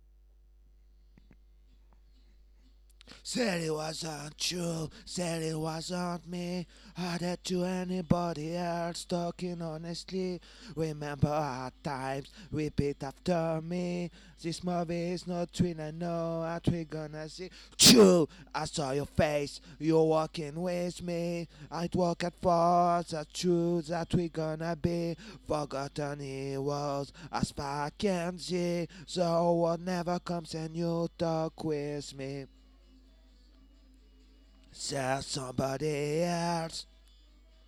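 Vocals part 1 backing vox on choruses not overdriven; REMIX KIT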